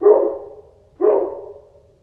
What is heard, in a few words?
distant, dog